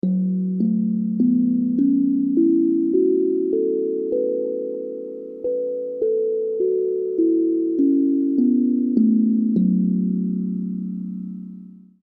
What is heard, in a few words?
Hapi Drum; Perc-melodisch